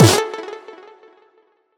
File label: combo power; quatuor